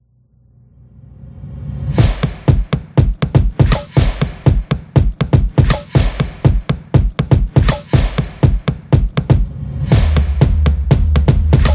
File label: Dynamic Intro; football promo